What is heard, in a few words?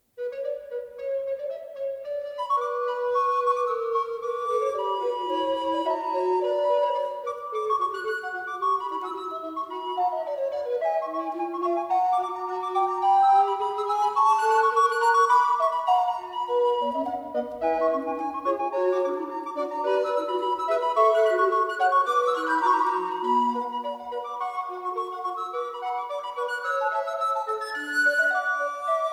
Concerto in C minor Vivace; BWV 981; after Marchello